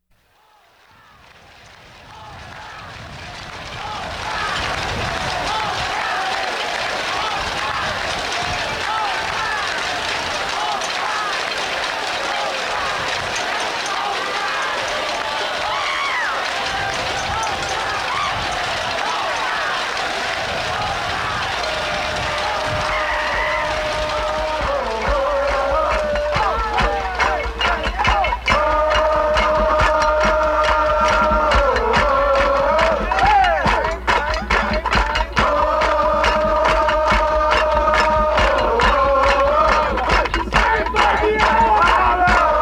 Live Version with crowd rain chant, additional stage dialogue; Woodstock